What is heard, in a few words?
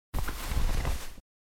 Reaching Into Laptop Bag Case Deep Pocket